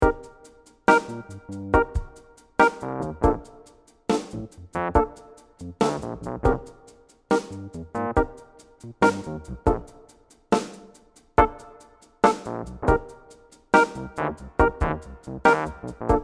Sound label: west coast vibes